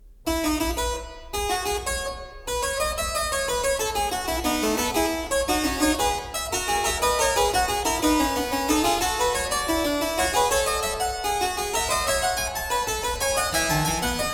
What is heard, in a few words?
Fugue, Well-Tempered Clavier, klavier; BACH; WTC 2